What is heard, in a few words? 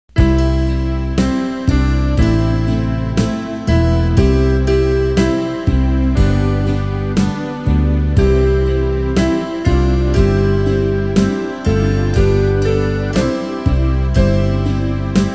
ALTO